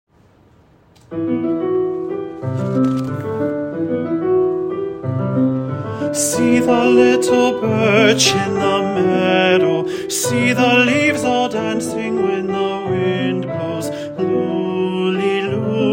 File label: Alto